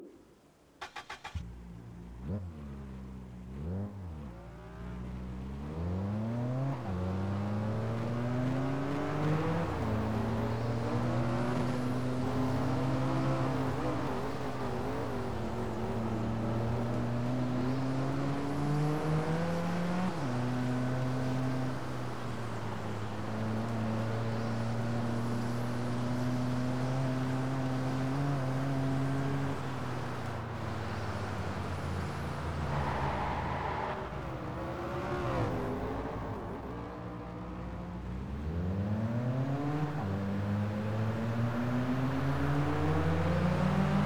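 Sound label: mercedes-benz slr mclaren, car; TDU-1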